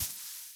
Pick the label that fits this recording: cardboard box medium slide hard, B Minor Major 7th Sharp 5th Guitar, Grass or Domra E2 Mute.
Grass